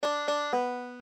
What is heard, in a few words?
girl i left behind, banjo